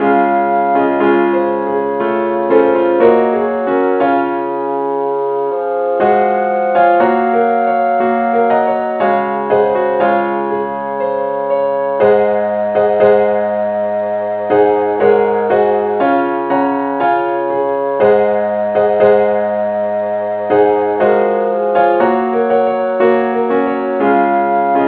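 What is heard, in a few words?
keyboard key F sharp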